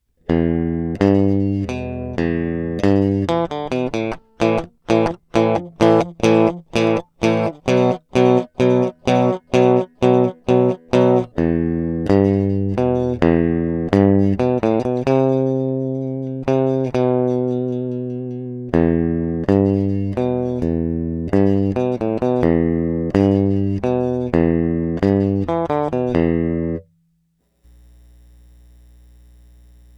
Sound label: Elect Guitar